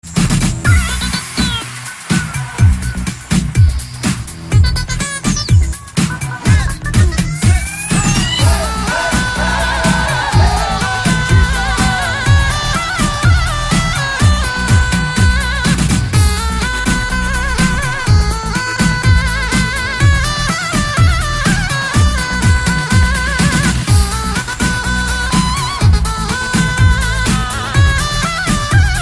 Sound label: Digital Zurna